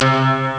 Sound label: B synth; ST-XX Amiga Soundtracker Sample Packs